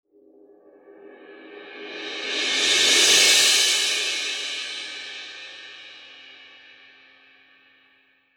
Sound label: cymbal long crescendo